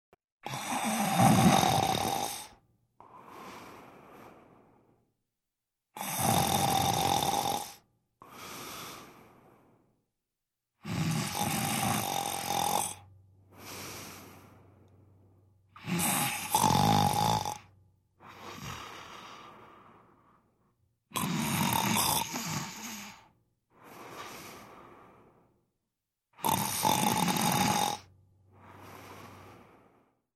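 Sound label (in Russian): храп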